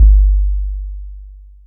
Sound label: KICK ONE SHOT 808